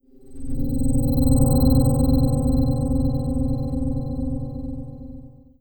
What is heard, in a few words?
FX UFO Swell